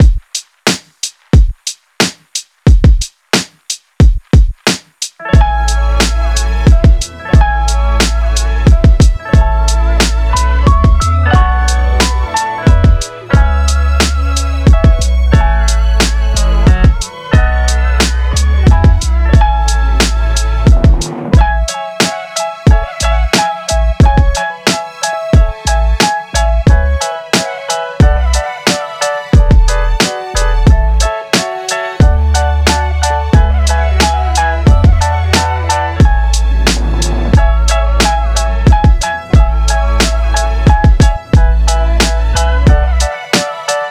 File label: Backing Track